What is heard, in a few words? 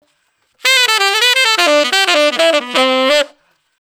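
125 NuJazz Sax D major; Brass; Digidesign; Pro Tools; Pro Tools Essential Loops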